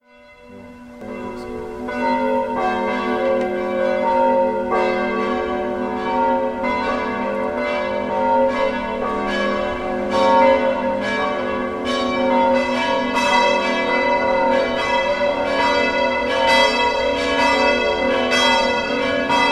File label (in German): Glocken